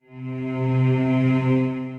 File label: Cello Press; Strings; Granulator III; Ableton; Factory Packs